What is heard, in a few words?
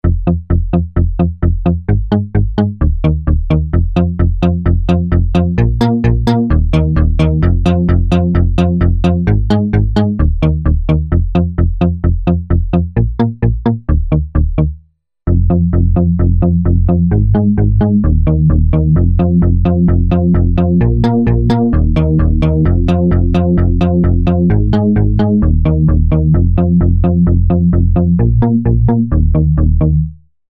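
AMBIKA 96kHz velocity to VCF; EIII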